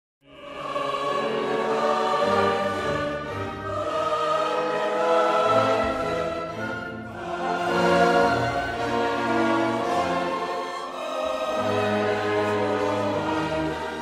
Chor Wohlan ihr Reichen, weinet; Erntefeier

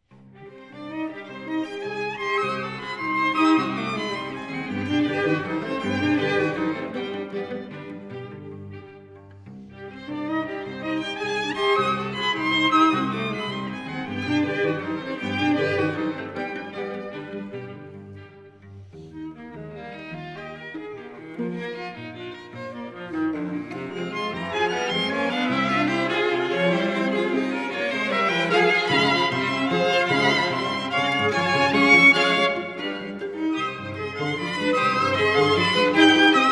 Streichquartett

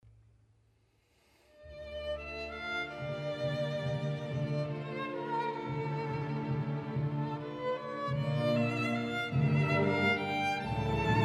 Violinkonzert; 2.Satz

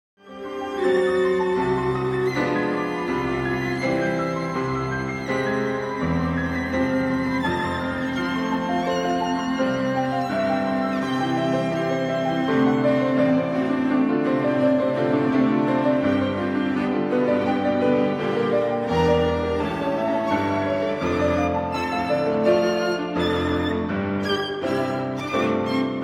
klaviertrio satz

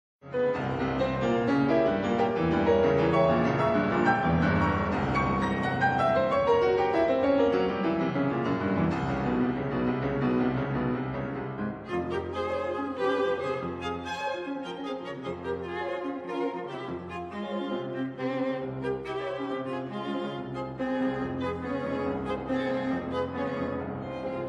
klaviertrio satz

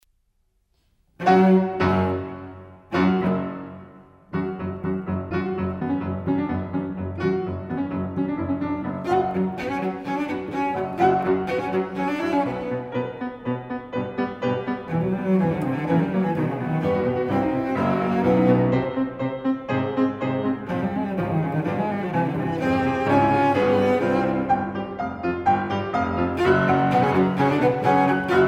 Cellosonate

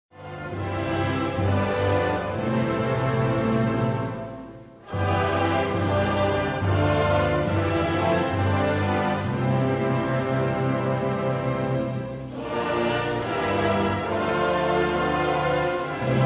Gemeindegesang; Erntefeier

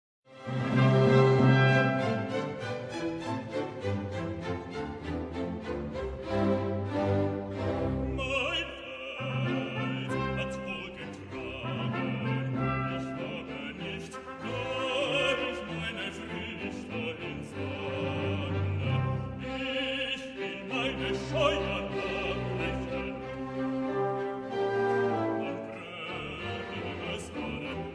Bass Mein Feld hat wohl getragen; Erntefeier

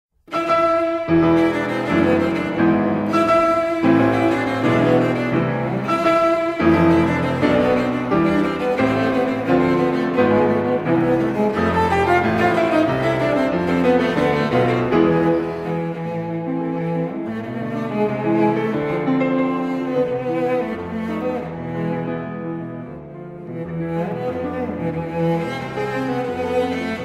cellosonate; 1.satz

